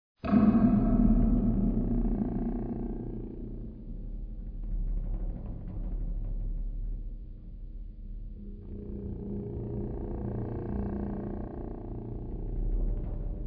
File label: Rumble